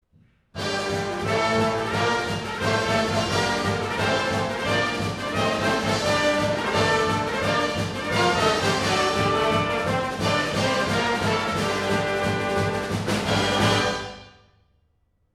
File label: Fanfare Thunder; 学生歌